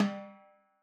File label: timbales High Rimshot; Raw Perc One Shots; Slate; SD SEISMIC Drum Sample Pack